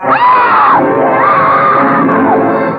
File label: Scream; Sonic Foundry (Sony Creative Software)